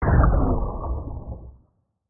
poison magic; 魔法効果音

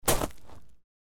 footsteps gravel

